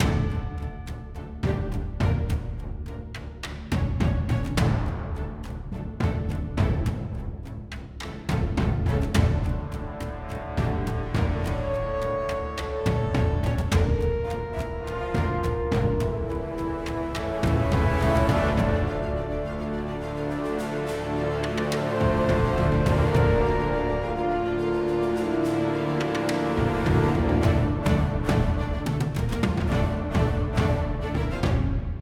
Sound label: login Bgm